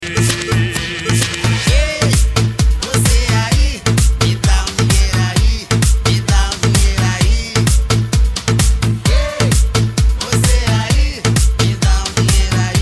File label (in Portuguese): sound car carnaval